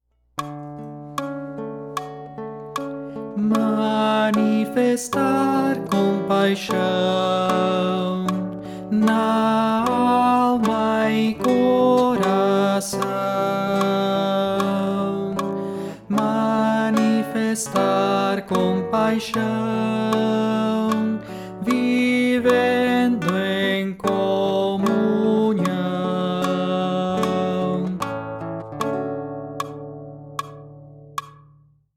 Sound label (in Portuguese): Tenor